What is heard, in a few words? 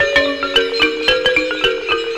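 110 BPM; RHYTHMLOOPS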